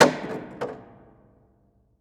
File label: Crash Wood Board On Hollow Metal Container With Resonance; Crashes